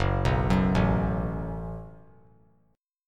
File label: Gb Minor Double Flat 5th Gb, guitar; arpeggiated; 6,6,9,7; 3C,3F,3A,3D